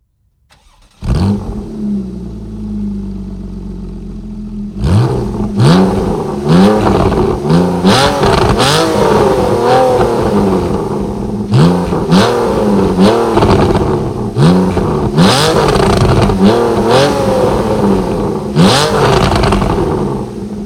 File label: GT Exhaust start and gas play valve open